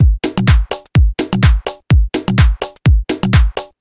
tech drum fill clean, 126 bpm

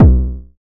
short reverb kick sample b key; Channels Mono

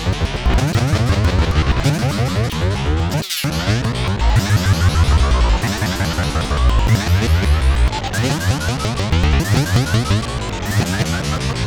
game bouncy fracture textured

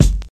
sharp kick drum sample F sharp key; kick drum samples

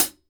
short closed high hat sound D key

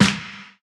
reverb steel snare drum g key

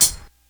closed high hat sample c key; hat samples

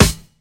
airy acoustic snare a key

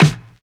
boomy snare drum sample d sharp key; snare drum samples

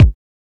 boomy kick drum single hit a key; kick drum samples

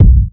low tone kickdrum b key; kick drum samples

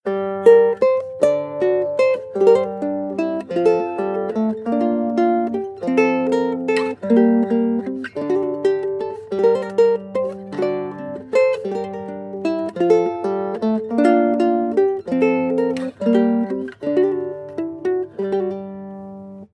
guitarlele classic sequence; loops

